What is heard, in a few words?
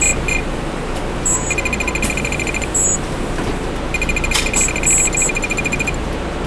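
computer talk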